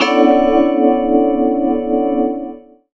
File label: Chord Stab, Keys; Zero-G Jungle Warfare 1, 2 & 3; 90 s Sample CDs